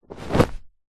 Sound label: clothing suit trousers pants drop